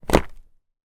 household vacuum cleaner bosch pro animal hose remove from base